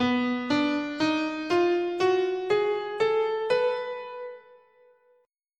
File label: B Hungarian Major, scale